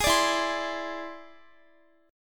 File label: E Augmented 7th, Guitar; strummed